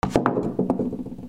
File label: GARAGE